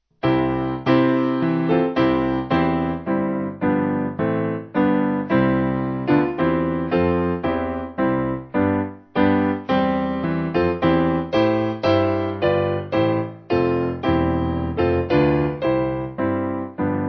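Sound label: Piano, church music backing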